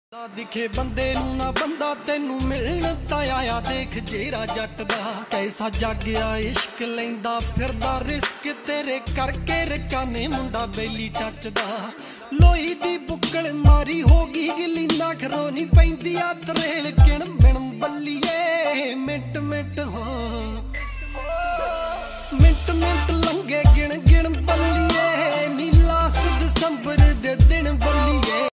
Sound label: Dance Punjabi Ringtone